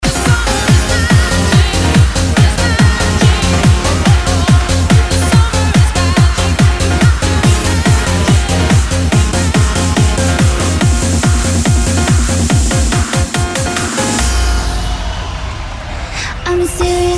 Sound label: electro house e dance